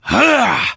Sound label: Attack; Prince Valanar